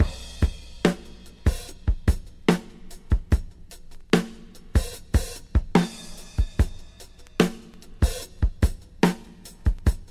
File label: 73 bpm high quality drum loop sample A sharp key